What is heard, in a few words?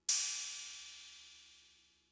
Cymbal; Fricke MFB-522